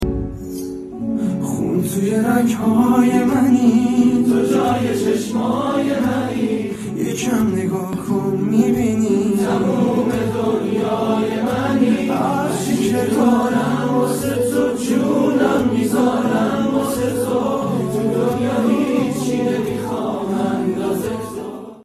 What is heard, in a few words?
Guitar